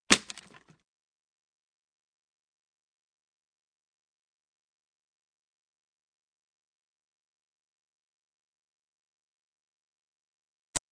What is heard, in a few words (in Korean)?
Fights, 싸움-전투; 헐리웃특수효과음